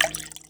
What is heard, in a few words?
potions bottle fill; Mineclonia